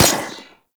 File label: Rifle Fire